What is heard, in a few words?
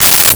Cable Tie; Tools